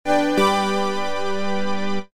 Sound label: Success